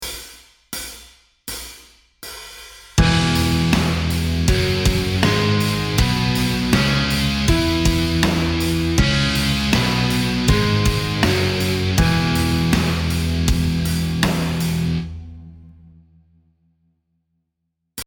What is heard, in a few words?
Exotic Modes E Major Pentatonic; metal music theory